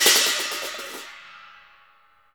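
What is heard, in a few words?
CHINA, cymbal; Spectrasonics; Heart of Asia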